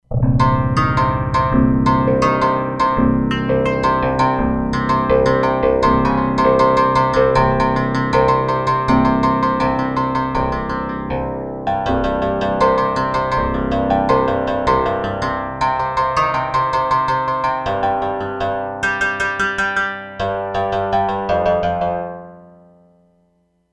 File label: Berimbau, E-mu Proteus-3; Factory patches